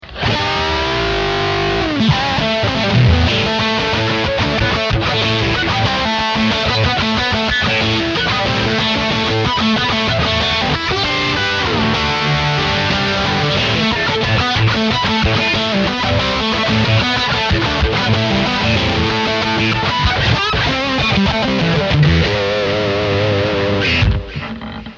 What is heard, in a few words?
Dirty And Mean